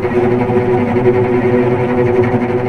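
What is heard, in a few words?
cellos tremolo B2 piano left, string; East West - The Ultimate String Collection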